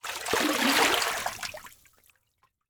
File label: Liquid Water Water Splash Small